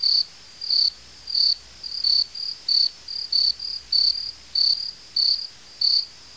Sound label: cricket loop; storybk